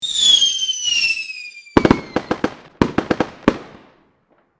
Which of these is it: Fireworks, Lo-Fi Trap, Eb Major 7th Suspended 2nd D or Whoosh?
Fireworks